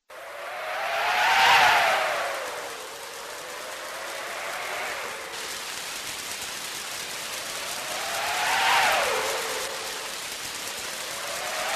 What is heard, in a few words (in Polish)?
Odglosy burzy